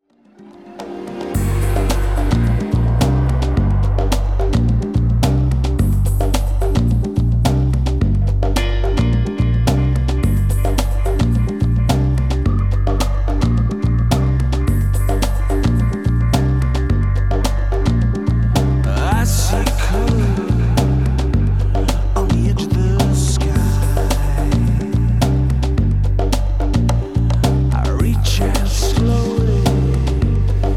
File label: slow grooves